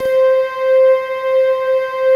SOFT PLUCK RS, pads; Pro-Rec - Synthsonic

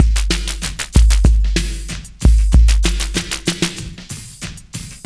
raspberry blower, 95bpm

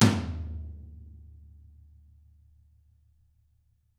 DW 14 HIT; DW KIT1

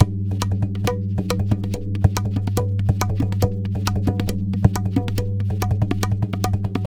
Tabla, 140 BPM; Zero-G; Pure Tabla

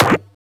Percussions

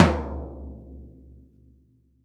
P. F2 TOM F; VELOCITY TOM 2

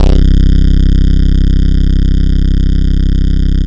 Bass C1, club techno; Zero-G

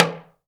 TALK DRM, Heart of Africa; Spectrasonics

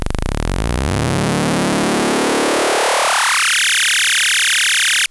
Monotron Sci-Fi

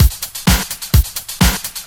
Straight Loop 128 BPM, House

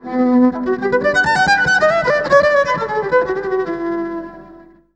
EVIOLIN, ELECT VIOLIN; Best Service; World Colours

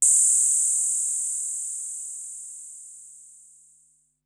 Noise High, Korg Wavestation-SR, Drum Kit; Wave 455 - Drum Kit

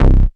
Basses Root C

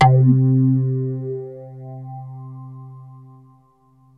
BASS, MORPHEUS; Syntec; Wall of Sounds

